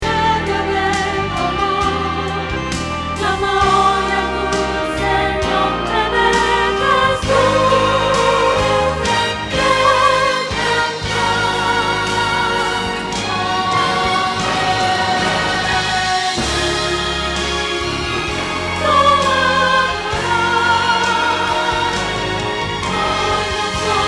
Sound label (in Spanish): Contralto